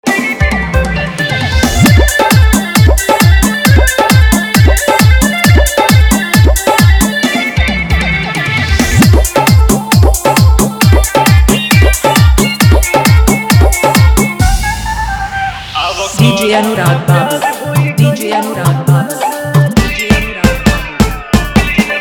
Dance Mix

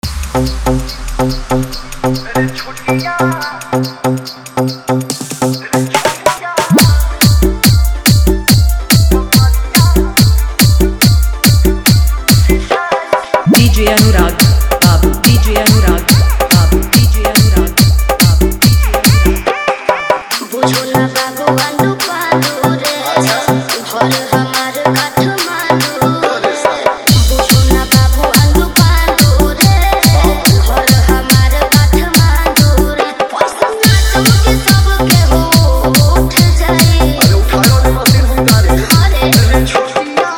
Faadu Hard Bass Mix

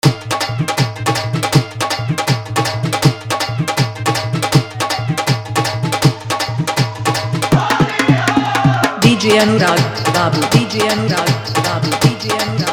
Holi Full Hard Bass Mix

